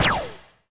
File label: BLIP, Anime Sound FX, SCIFI